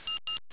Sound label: beep